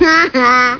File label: laff; bart